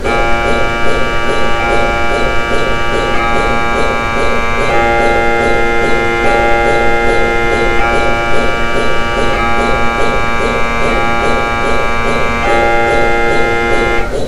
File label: Ambient Cryogenic Localizer